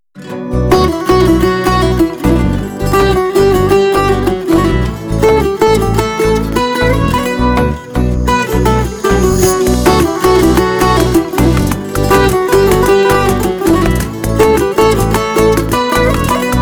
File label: Music No Melody; 6-8